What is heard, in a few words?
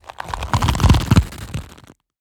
creature crab organic texture carapace crack movement; Bluezone Corporation